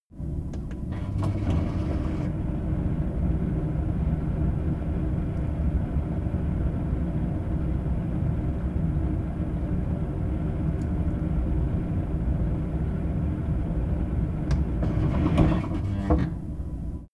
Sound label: kitchen fan